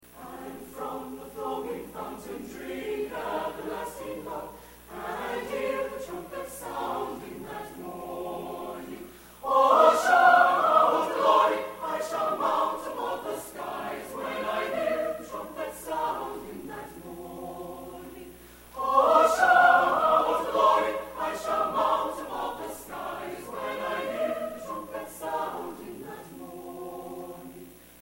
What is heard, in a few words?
choir